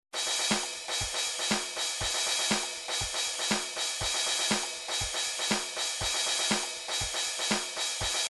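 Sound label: drums pattern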